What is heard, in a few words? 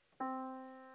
yamaha Disk